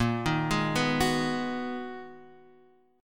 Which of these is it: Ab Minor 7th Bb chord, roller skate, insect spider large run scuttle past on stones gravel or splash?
Ab Minor 7th Bb chord